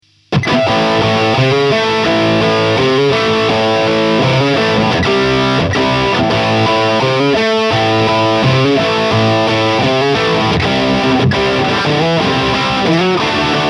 Brit Stack

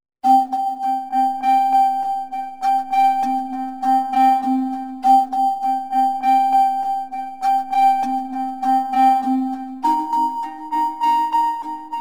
Motors; Umlaut Audio

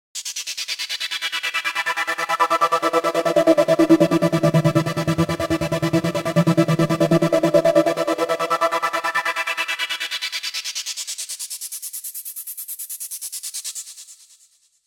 R3 High Pass Gater

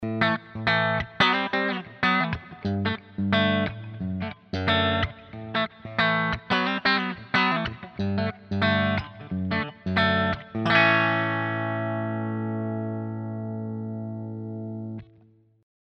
Epiphone Modern Les Paul Bridge clean

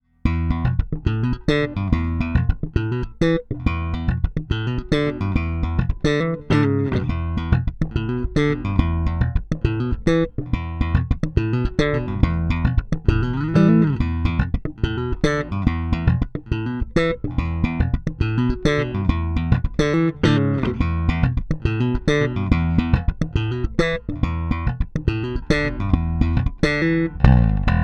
Jazz Active Slap Amp DI post EQ

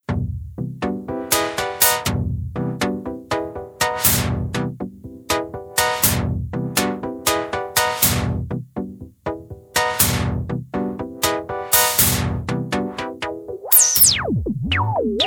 Filter MOD Sounds

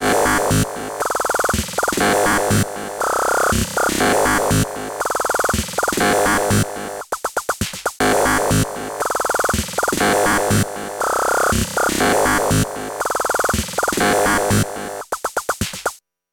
Transient Plus Audio Trigger; Future Retro

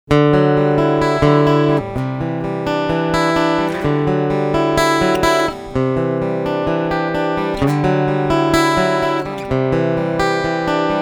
Picking EQ Flat

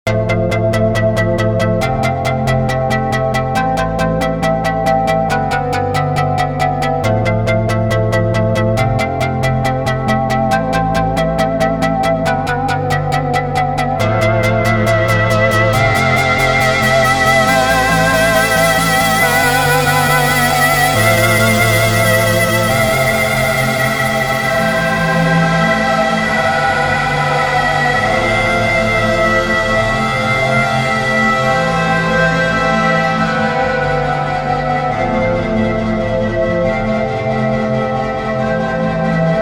Sequential Prophet X Wave Strummer

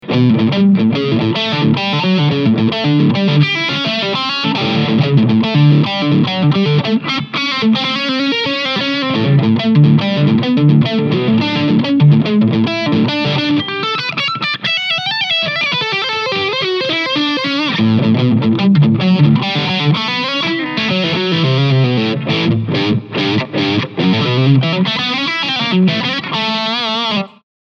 Blackstar Silverline Special Super Crunch 6l6

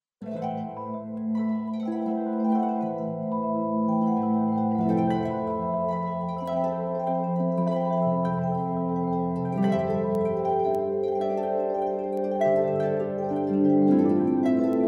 harp ensemble drops; NI arkhis

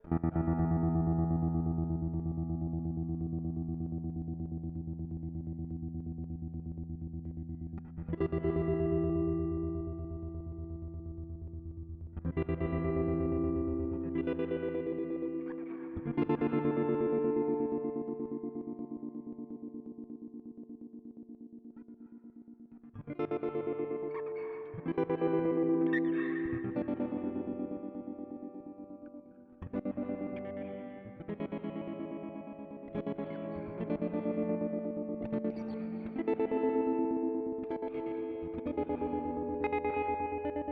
Electro Harmonix Oceans 12 Tremolo Auto Inf

